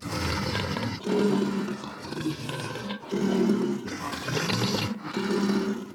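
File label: deathclaw conscious loop; npc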